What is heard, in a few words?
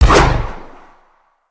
Jump Projection; Footsteps; Wall Run; Characters; Tenno